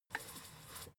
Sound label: fader plastic stutter soft short